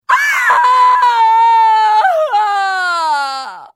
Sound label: scream